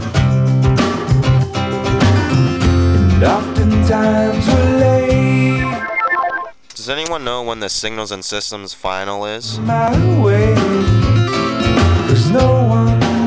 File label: passive user listening music; Receiving an asynchronous chat message alert while listening to music in Passive Mode (more intrusive); Voice over IP